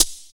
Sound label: FX Dance; Sound & Vision; Gigapack II CD 2 (Roland)